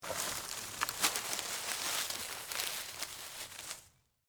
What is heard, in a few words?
Branch Drag; Dragging; Objects; BlastWave